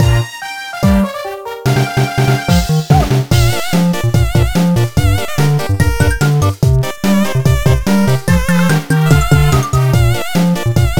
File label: Battle Snake; Slide Adventure Mag Kid